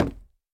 錾制书架破坏声

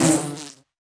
蜜蜂受伤声

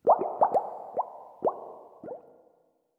气泡柱上升环境声; ambient

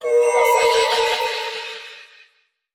空手待机声; 悦灵

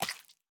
青蛙进食声

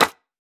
泥砖踩踏声

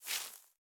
大型垂滴叶向上倾斜声

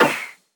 旋风人格挡弹开声

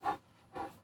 熊猫喘气声

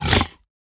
猪灵蛮兵受伤声

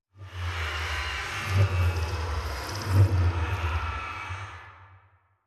重生锚环境声; ambient

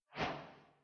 提示框收回声

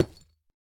深板岩放置声

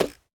竹踩踏声

